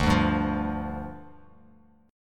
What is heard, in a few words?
D-Minor Double Flat 5th, D, Bass Standard; 10,5,5,10; Dmbb5 strummed